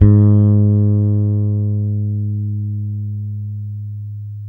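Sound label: 6-string fretless bass; Spectrasonics; Bass Legends; 6-STR FRETLS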